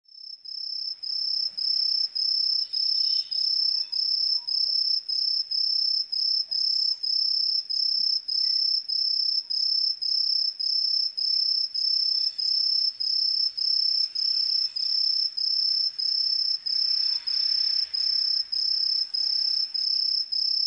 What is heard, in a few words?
Calyptorypus hibinonis; アオマツムシ; コオロギ科; 日光市松原町 alt=550m; Rec.: SONY TC-D5M; Mic.: audio-technica AT822; 他の自然音： 電車; Kokekokko -Nikko Today